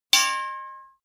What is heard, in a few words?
knives large hit chink